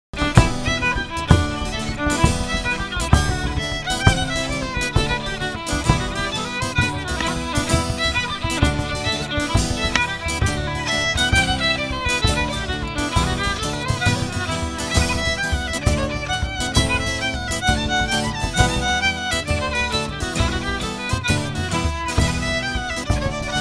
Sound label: JIGS; Trip to Sligo